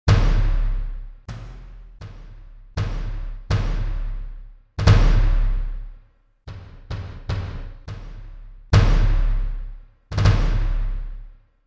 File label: Bass drum